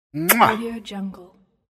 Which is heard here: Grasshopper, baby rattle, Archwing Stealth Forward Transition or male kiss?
male kiss